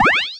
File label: Syn Dr, Overloaded Synthetic Drums; style kits